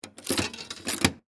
Arcade Game Push, Technology, Arcade, Game, Push, Quarters, Into, Slide, Mechanism; Blast Wave